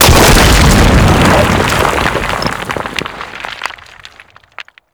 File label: Asteroid Rock Explosion; Warframe